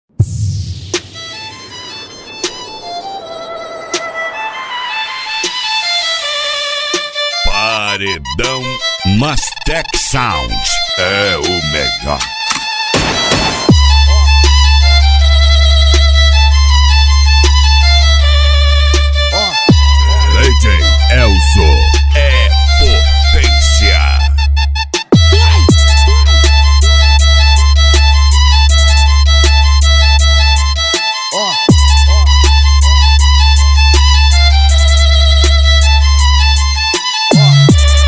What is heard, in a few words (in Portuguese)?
violino; paredao mastek sound